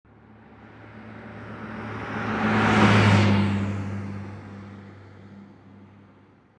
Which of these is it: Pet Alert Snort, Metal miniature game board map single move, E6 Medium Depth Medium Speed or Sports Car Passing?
Sports Car Passing